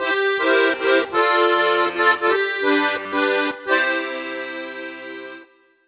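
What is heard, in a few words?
Accordion 108bpm C end, African; Quazibeat - African Secret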